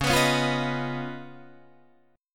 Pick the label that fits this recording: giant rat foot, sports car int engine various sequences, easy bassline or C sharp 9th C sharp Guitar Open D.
C sharp 9th C sharp Guitar Open D